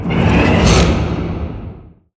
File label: sliding door lg verb close slide; Titanfall 2